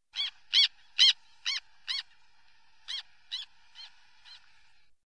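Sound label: black tern voice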